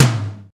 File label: short reverb tom drum single hit g sharp